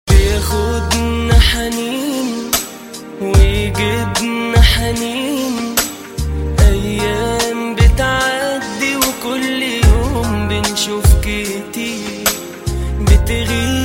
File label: arabic music